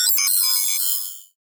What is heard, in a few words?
modular ui fm solo bleeps; soundmorph